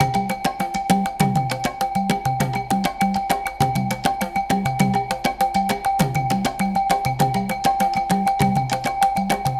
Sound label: big win; gods of gold